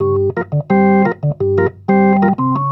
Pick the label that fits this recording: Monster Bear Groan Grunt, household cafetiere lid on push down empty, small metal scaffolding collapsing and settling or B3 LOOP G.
B3 LOOP G